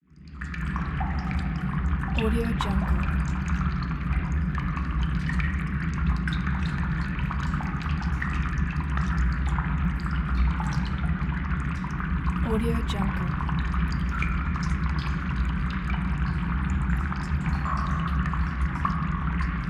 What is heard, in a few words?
sewer ambience